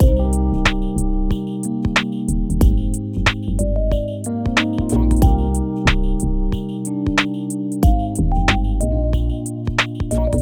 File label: Vibes, Loops